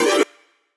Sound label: G STAB, funky